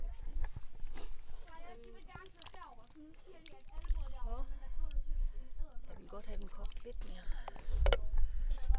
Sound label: Cooking